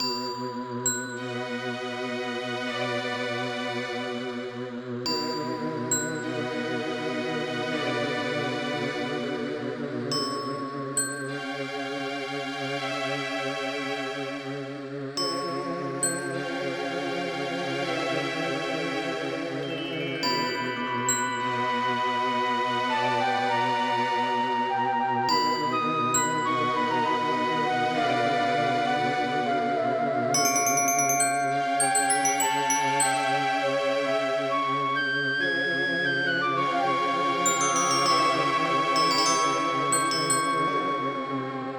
Horror

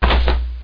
Item Suit; lamsword

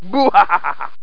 boohaha; Doom2

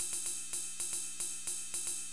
rave Gourd; SGI O2 Out of Box Experience; jungle Island; Cyber Gourds

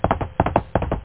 Gallop

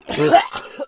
sfx vomit male; Earth and Beyond; EA Games

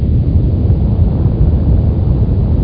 ERUPT

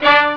Trumpets; System7; Instruments

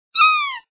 Woman Shout; hammer

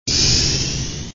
power Up Sound; asteroids revenge 3

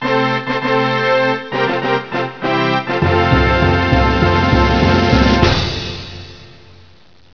fanfare

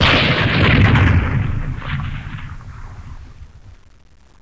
explosion

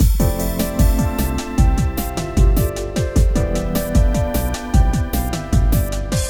bombo groove

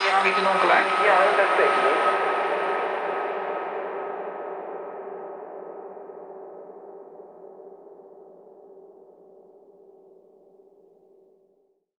AMBNT 160; Ambient

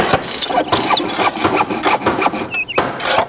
city

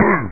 appear; Zombies

